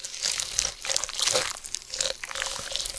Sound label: web spin; weapons